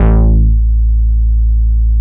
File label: DETUNE BASS, VINTAGE VOLTAGE